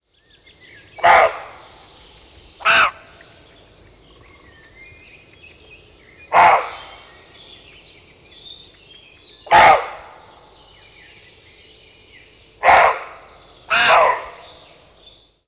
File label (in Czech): hlas zvuky zvirat